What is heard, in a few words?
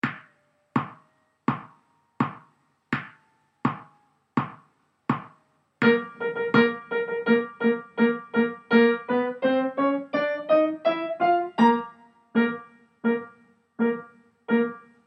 pomp and circumstance piano